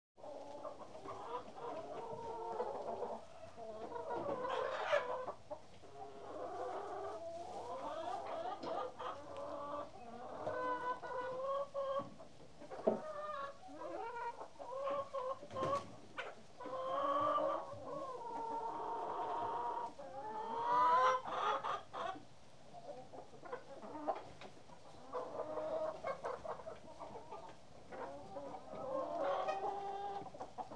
Hen House; Animals and Birds; BBC Sound Effects Library

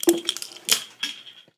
bottles popping; Sound Design Tools; Raw Foley

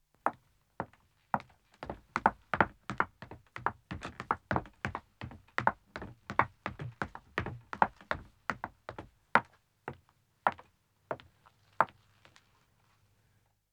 Wooden Stairs Descending, 1 Wo, Footsteps; BBC Sound Effects Library; Footsteps II

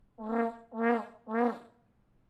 Vuvuzela; TheWorkRoom Audio Post

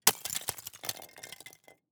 ICE Thin Smashed Brittle Fragments; Articulated Sounds

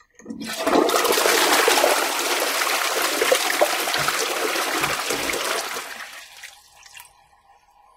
toilet flush; Foley; Kenney's Sound Pack

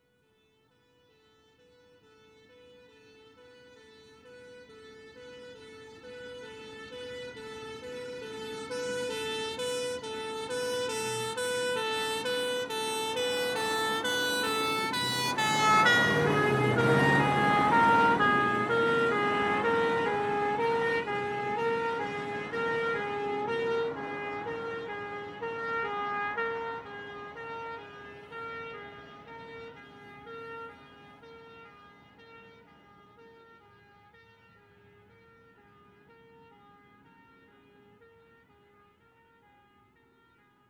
Fire Engine, Pass R To L With Rev; Emergency; BBC Sound Effects Library

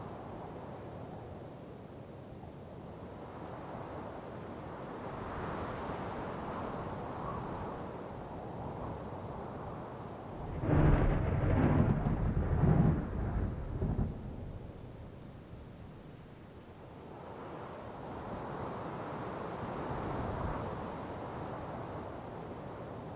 Werewolf Background Atmosphere Loop; Olivier Girardot; Monster Sounds & Atmospheres

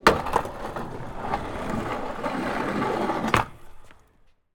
Skateboard, Put Ride Take; LukasTvrdon; Design Source 192

